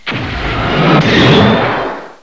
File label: MONSTER; Heroine Iysayana Trilogy